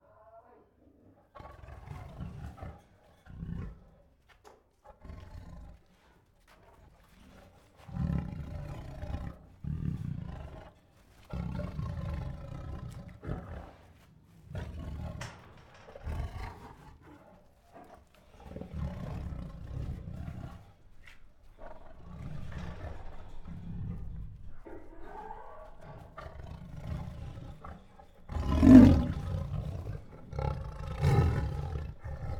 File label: Restless with Growls, Huffs, Moans and Roars, Tigers; Sound Ideas